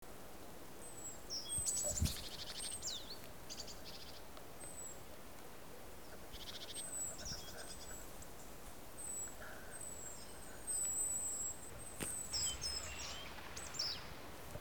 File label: alarm